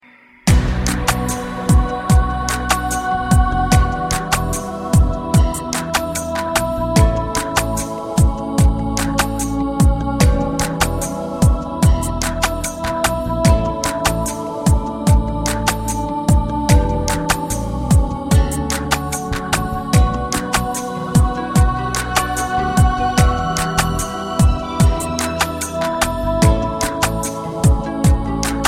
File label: Relaxing Tone